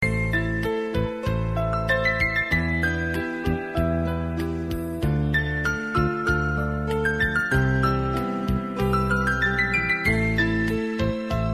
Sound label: Assyrian Music